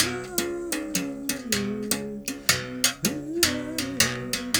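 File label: 79 bass berimbau phrase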